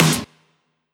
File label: Rokkers Snr; Zero-G; Soundclash